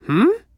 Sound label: Emote Male Confused; Idle Champions of the Forgotten Realms